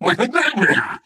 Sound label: ballbot impressive